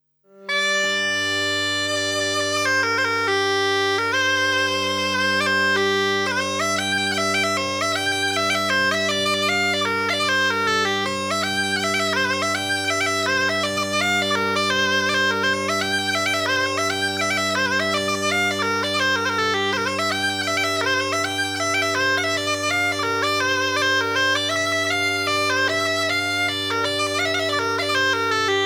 Mazurka; Mazurka de Geneix

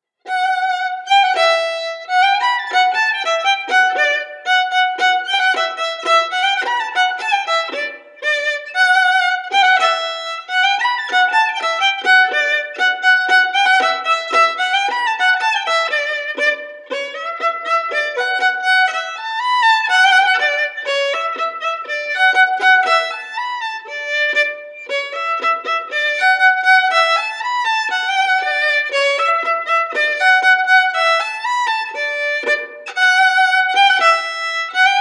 Bourree; Recueil Hainl; Bourree de Vichy

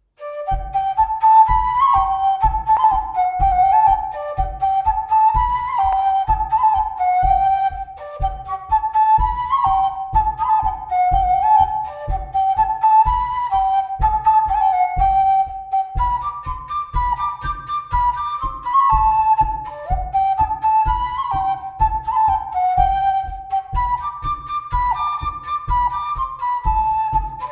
Bourree; Recueil De Raoulx

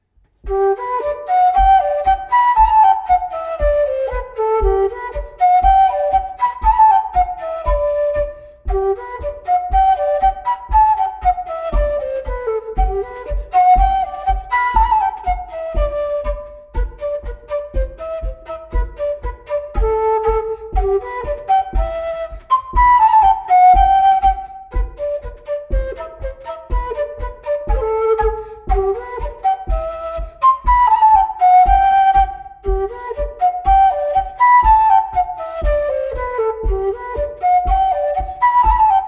Bourrée; Recueil Lemaigre

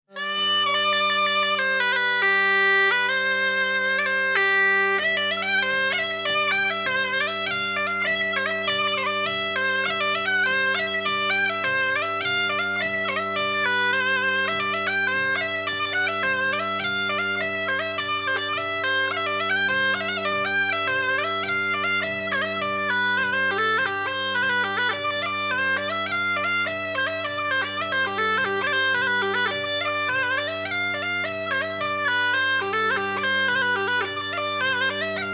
Scottisch; Scottisch des Gavinet

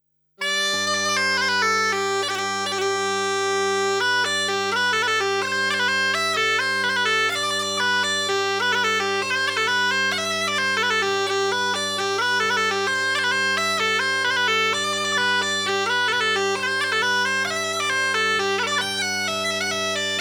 Polka; Polka du lac

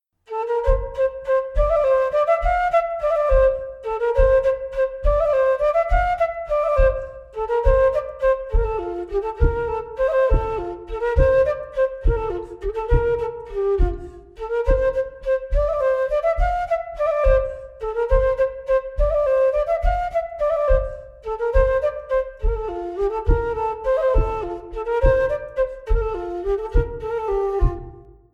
bourree; Coatrieux

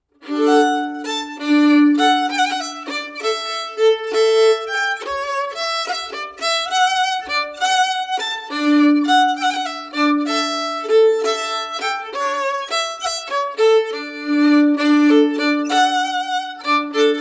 Valse

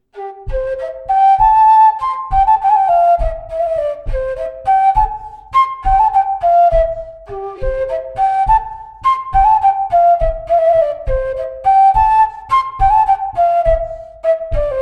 Montagnarde; Recueil De Raoulx

